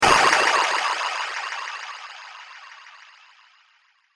Laser Plasma Rifle Echo, laser gun, sci-fi laser sound effect; Laser Future